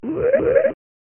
Attack (Rockpile); Dungeon Master and Chaos Strikes Back; Amiga

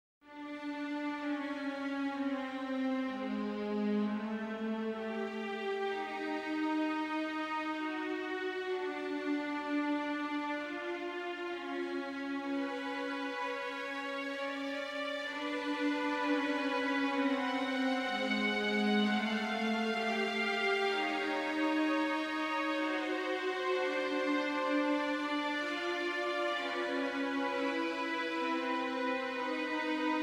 Music; Dungeon Master Nexus; Sega Saturn

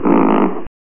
Attack (Pain Rat - Red Dragon); Dungeon Master and Chaos Strikes Back; Amiga

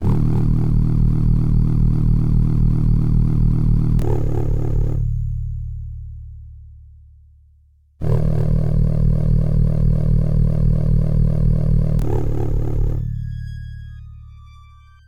Music; Dungeon Master II

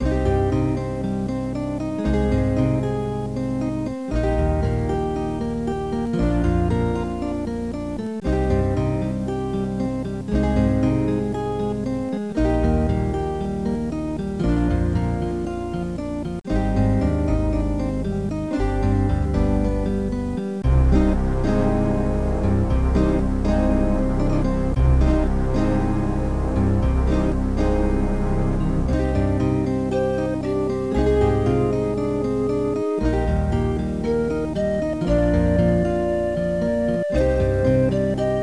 Music; Dungeon Master II; Macintosh

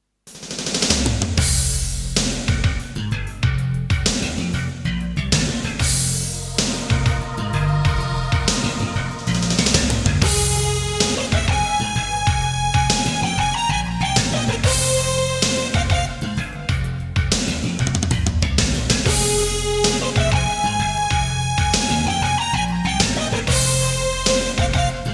Music; Theron's Quest; PC Engine

